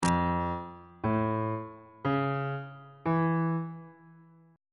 d harmonic minor 7th chord 3 is f augmented major 7th on bass clef